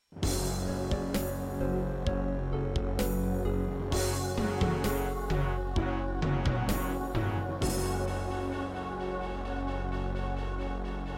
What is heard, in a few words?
Labway LWHA111800 (AdMos QS700); Doom (Sigil); Introduction